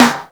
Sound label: Fat Machine snare; Drums; Snares